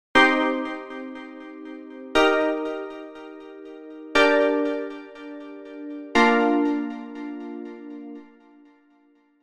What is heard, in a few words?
vital presets; chiqui audio; vital presets pack